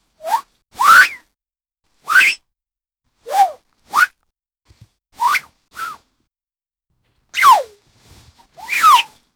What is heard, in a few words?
katana unsheathing, japanese